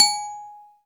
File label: TUNED PERC; Greytsounds; EXOTIC PERC